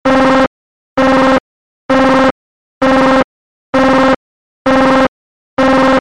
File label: Urgent Sms